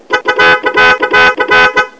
Boost

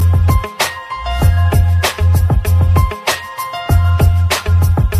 SMS Guitar

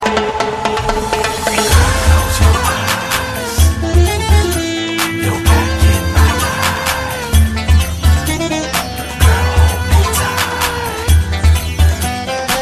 arabic

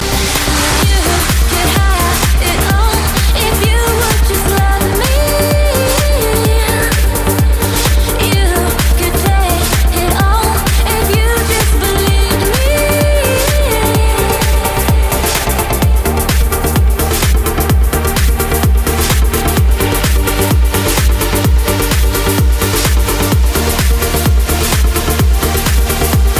islamic tune, ringtones classical

